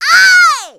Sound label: Wake Up; Gulley waking up Link; zelda